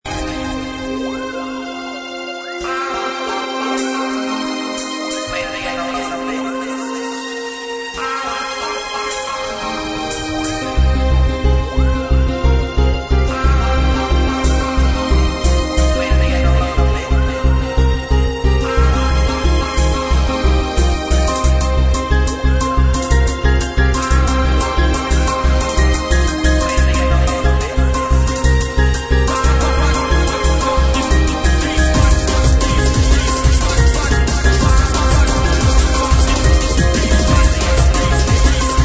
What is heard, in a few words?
Funky Beat, Happy Hardcore